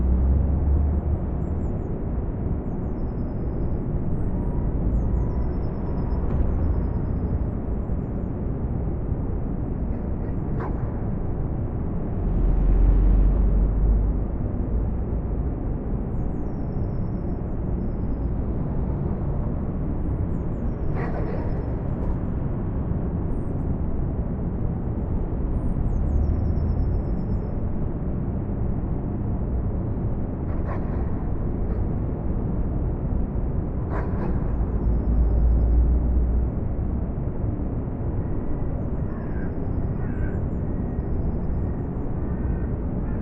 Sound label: Warehouse ambience; Phasmophobia